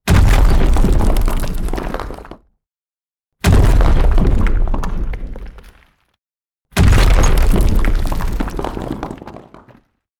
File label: Bassy Rock Impact Sounds